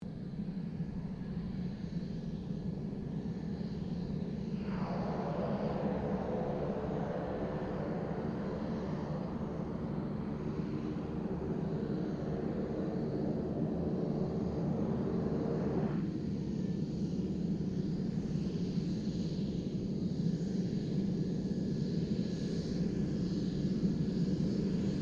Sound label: 2007 KING AIR 350 TAXI; Airplanes; Vehicles